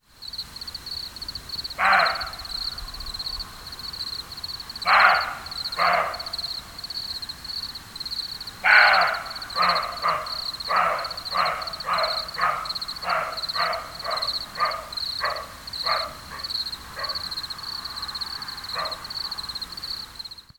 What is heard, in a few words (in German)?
BELLEN; Der Mindelsee - Heimat von unzaehligen Tieren und Pflanzen; natur erleben am bodensee